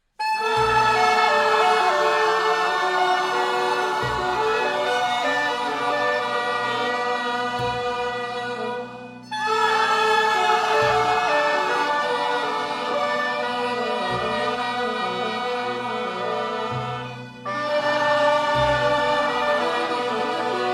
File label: blues